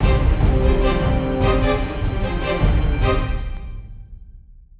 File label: battle, Orchestral Background Music; Epic Adventure